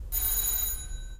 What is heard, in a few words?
door bell